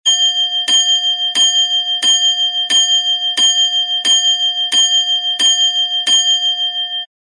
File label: bell fire single rings